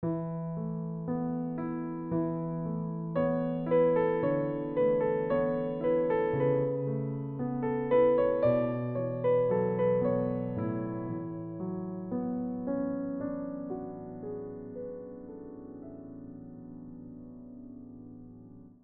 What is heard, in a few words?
romantic version